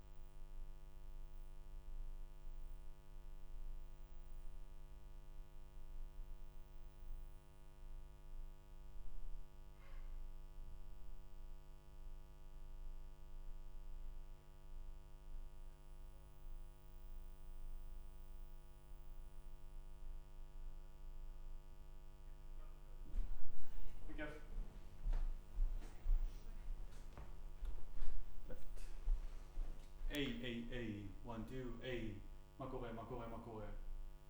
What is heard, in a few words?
Room, Choir